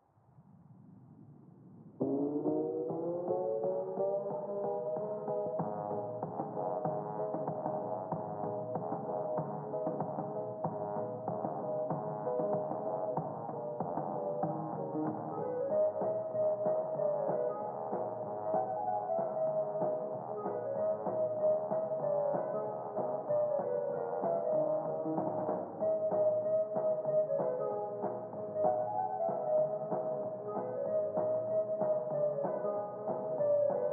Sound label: Lobby Jazz; Bendy: Lone Wolf